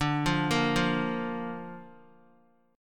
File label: D Minor Sharp 5th D Guitar; Dm#5 arpeggiated; x,5,5,3,5,x; JGuitar